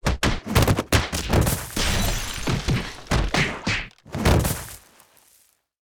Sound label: fight, sound effects; mystery island